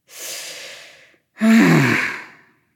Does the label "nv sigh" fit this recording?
Yes